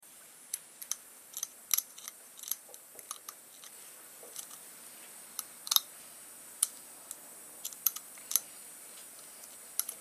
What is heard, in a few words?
HOSPITAL EQUIPMENT DENTIST: Working on teeth, scraping & picking.